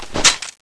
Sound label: clipout, weapons